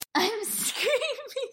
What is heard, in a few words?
im screaming